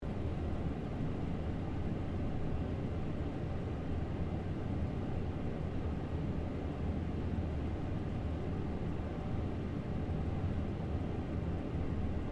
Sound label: Elevator Fan Loop, Office; Elevators (Sound Effects:Machines); SoundEffects-BlastWave